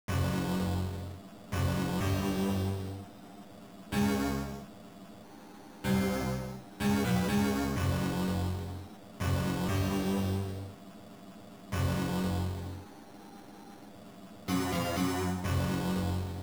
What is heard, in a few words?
Atmosphere; Valhalla Before the War; amiga; Level 3